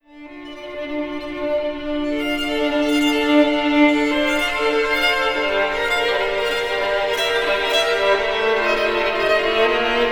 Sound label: Violin Marble Atrium Stereo; Blackhole Immersive